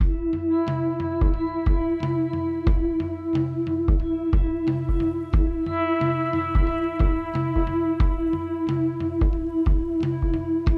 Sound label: Desert Ambient Theme; Forests of Augusta; Hirokez